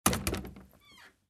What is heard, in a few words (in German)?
Tuer